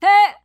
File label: "yeah", sung